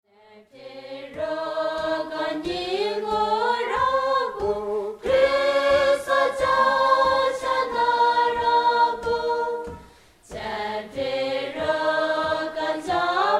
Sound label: carol